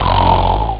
sleep; ST-XX Amiga Soundtracker Sample Packs

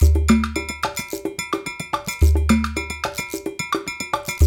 108 BrkDrm Regge; Spectrasonics - Supreme Beats - World Dance

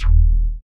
WET BASS; Masterbits; Dance Trax

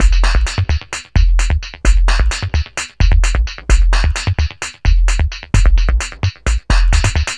Beats, Industrial

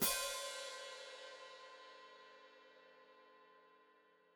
Hat Closed Open, Hi-Hat

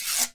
guiro, Synclavier Percussion, World & Orchestral; ILIO; Synclavier Percussion 2

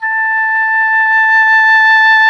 oboe dry; Roland L-CDX-03 Disk 1; Oboe 4-8

